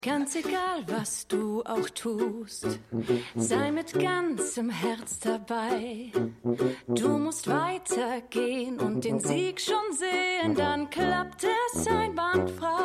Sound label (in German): Gesang; Schmigadoon; Emma Tate